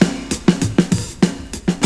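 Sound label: full brk, full break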